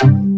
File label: VOCODER DRUM